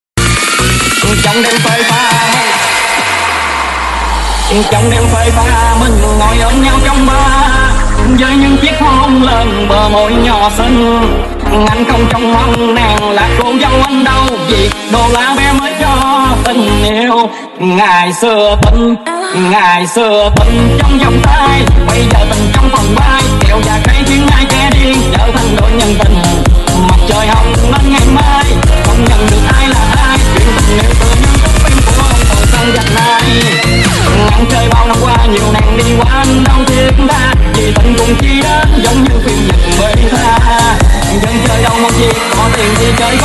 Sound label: remix